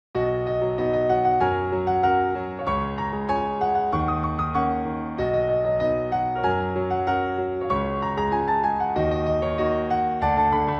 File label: piano ver.